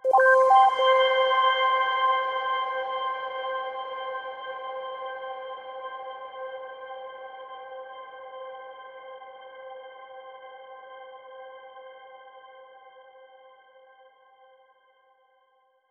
Satellite C, Simply Space

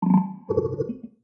Tech, Weird Side Samples

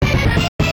jingles HIT; Kenney's Sound Pack; Music Jingles

